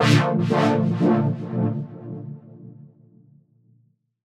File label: Dm Dub Wash, Chords; Electronisounds